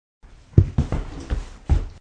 Body Get Up, Getting up after fall onto wooden floor.; Wrestling and Struggling; Get Up from Floor